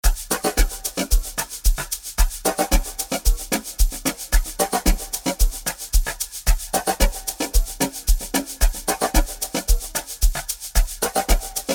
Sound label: Drums